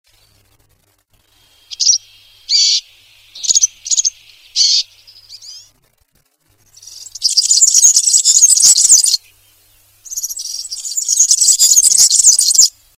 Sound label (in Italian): canto verzellino